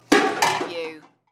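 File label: Kitchen Pots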